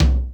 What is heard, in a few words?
Tom Low, Korg KR-Mini, Drum Machines; 300 Drum Machines